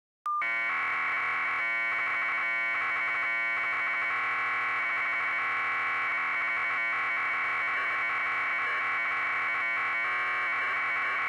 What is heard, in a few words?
modem handshake